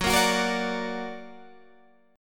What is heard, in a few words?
F sharp Augmented F sharp Guitar Open G; 16,15,16,15,15,16